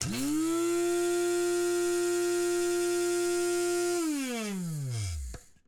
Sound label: Robo Motor Coffee Grinder; CoffeeGrinderB; Robobiotics; TheLibrarybyEmptySea